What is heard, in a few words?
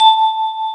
SYNTHESIZER DIGI DECAY